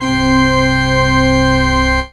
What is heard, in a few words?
Roland JV-880 Pipe Organ C5